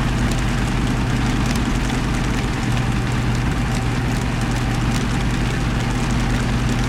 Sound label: panther engine rpm; War Thunder